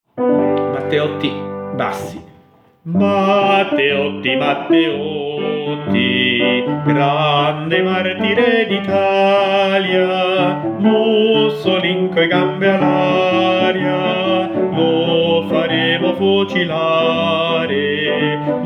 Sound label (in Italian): bassi; Strofette antifasciste; Matteotti